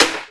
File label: GATE SN, Snare